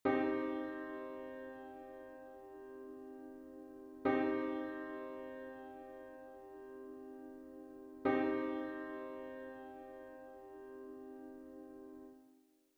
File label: Minor Chord